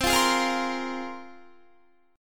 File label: C 7th Suspended 4th Sharp 5th, Guitar; C7sus4#5 strummed